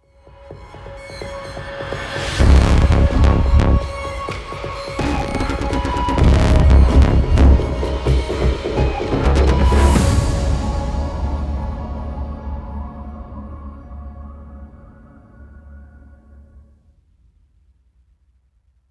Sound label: Multiplayer Downtown Start; modern combat 5 blackout; android